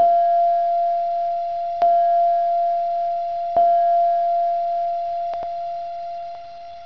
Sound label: gong; 999wavs